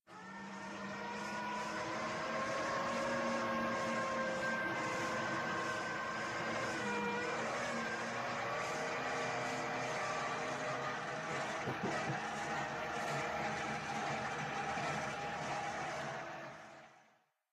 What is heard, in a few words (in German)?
rsound schrottplatz; minecraft